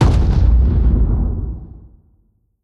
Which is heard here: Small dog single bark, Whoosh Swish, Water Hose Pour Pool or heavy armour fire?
heavy armour fire